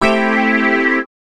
chords

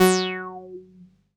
505, String Machines

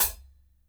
HAT, KLANKY KIT; Spectrasonics; Burning Grooves CD2

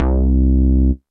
JUPITER BASS, ENSONIQ TS-10; Rubber Chicken Software Sample Bank

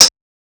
Hats, Alesis Performance-Pad

